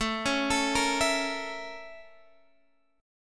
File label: A sharp Minor Major 7th Flat 5th A